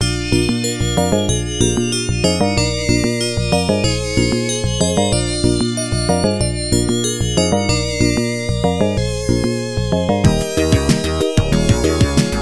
Boss Clear

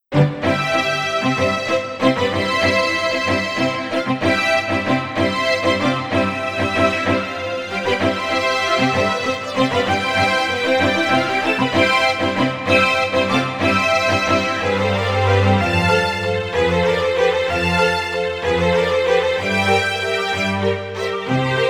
Waltz for a Young Girl; Noel

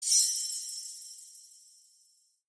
templar appear sound; Arc of Templar

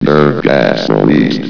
Nerve Gas Released; Team Fortress Classic

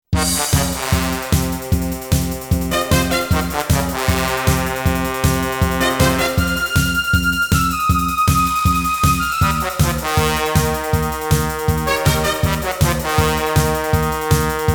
Synthesized Version; Dragon Ball Z: Ultimate Tenkaichi; PS3; Xbox 360

